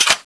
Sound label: clip out; arx160; weapons; cstrike